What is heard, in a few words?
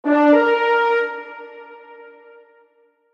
alert